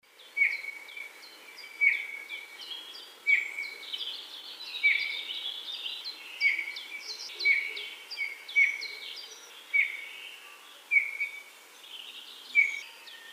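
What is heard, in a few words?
bullfinch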